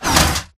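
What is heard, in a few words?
Stalker Movement Servo Damaged Walk; Titanfall 2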